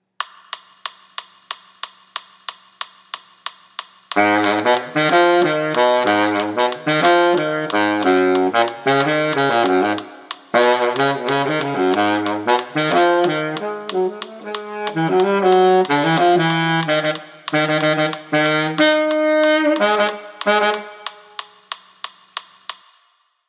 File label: bari latin